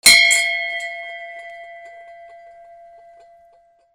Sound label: BELLS